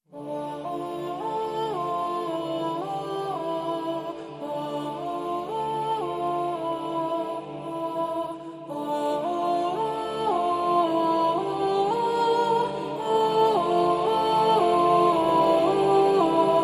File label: Choir Stem